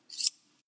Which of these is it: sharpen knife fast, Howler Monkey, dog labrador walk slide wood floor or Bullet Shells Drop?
sharpen knife fast